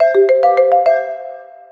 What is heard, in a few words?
me item